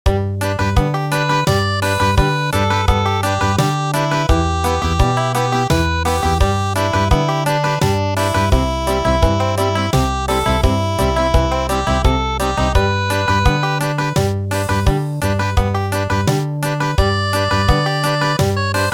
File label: Country, midi